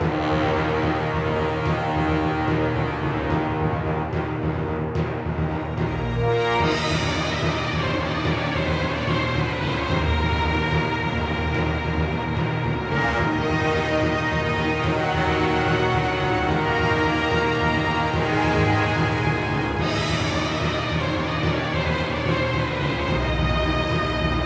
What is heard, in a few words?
Bossbattle Loop; Might and Magic Clash of Heroes